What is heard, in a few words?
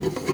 GUITAR FX; Xsample VOL-2